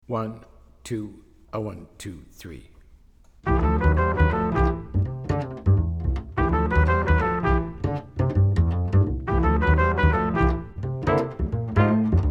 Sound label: minus Drums; count off 2 measures, into pickup; comp/hits for the melody; comp for the trumpet, piano, and bass solos